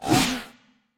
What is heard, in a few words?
Dash; Caffiend; Going Under